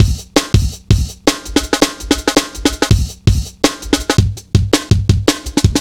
165, Drum Loops; Total Drum&Bass

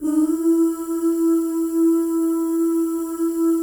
FEM OOS, Symphony of Voices; Spectrasonics

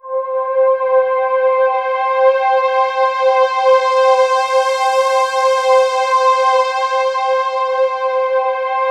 C5, Synth Leads

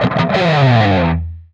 PRS SLIDE, guitar

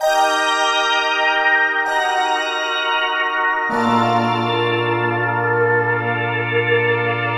Synth Pattern; Electrocution

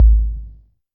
TR808 Tom, Roland SH-32 Drumset

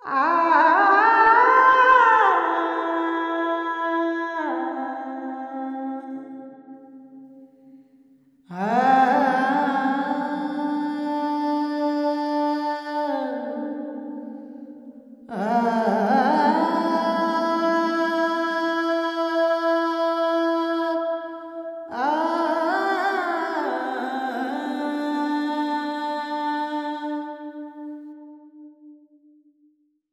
Vocals Male, World Pop; Sonic Foundry (Sony Creative Software)

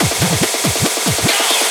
Fills, Loops 140 BPM

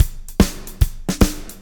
POWR, Backbeat, SHUF

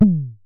Synare Filter; Star Instruments Synare 3

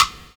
HORSE BEA; Univers Sons

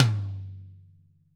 TOM-TOM-L, ACOUSTIC KIT; Greytsounds VOL-1

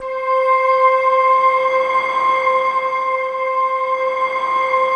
BELLPAD C4, Yamaha VP-1; Music Store; 90's Sample CDs

